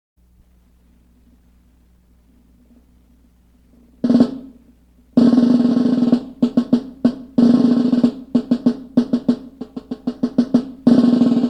snare

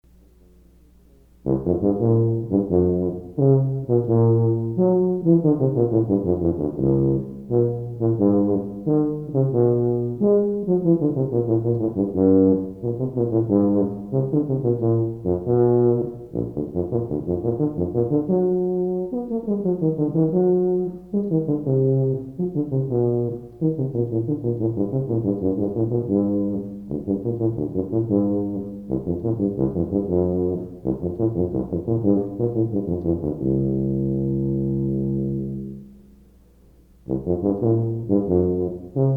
tuba

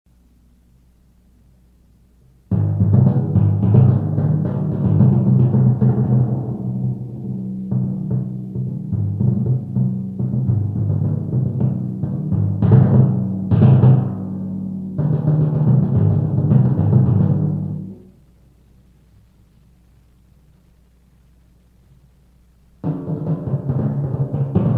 timpani

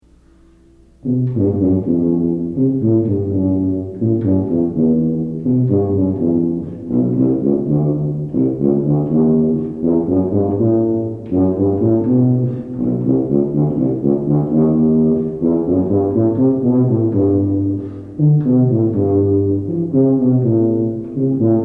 tuba

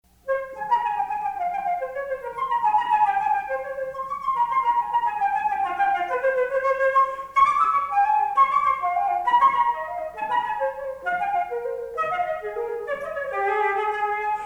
flute

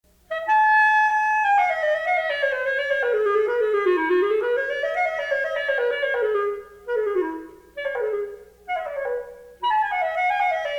clarinet

